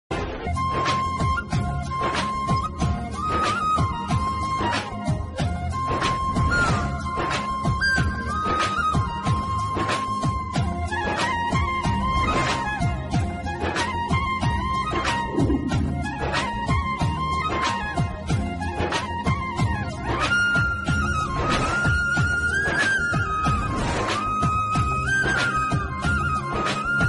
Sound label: Serial Flute Bgm; Sembaruthi